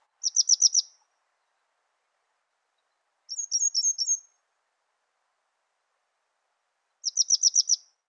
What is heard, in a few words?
kisekirei, birds